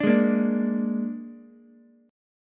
G sharp Suspended 2nd Flat 5th G sharp down Ukulele, chord; G#sus2b5; strummed; JGuitar